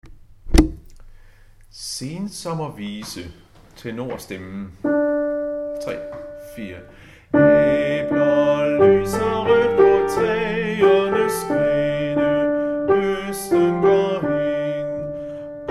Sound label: tenor; harlev koret